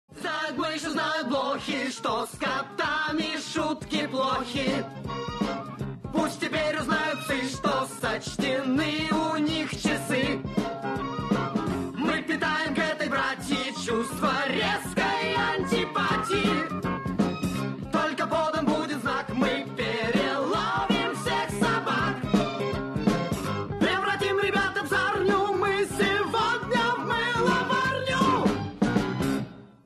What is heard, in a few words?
cats song